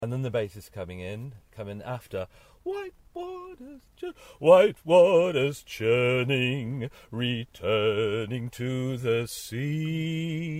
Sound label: Bass